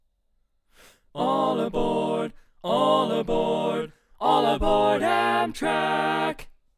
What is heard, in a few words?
Tenor, barbershop